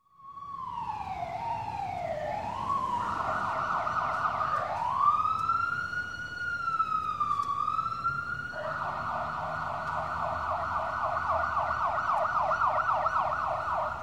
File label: Squeak Plastic Plexiglass Medium Squeaking; Sound Ideas 2000 Series